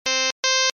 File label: hi CC5